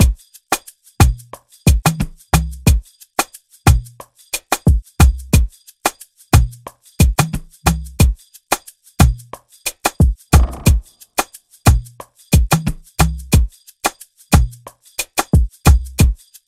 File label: 90 bpm afrobeat drumloop; matabele